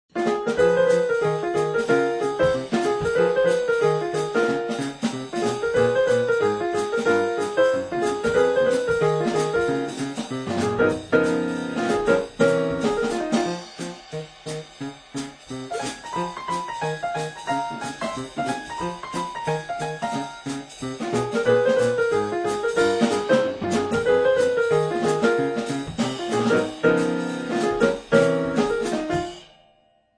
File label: piano drums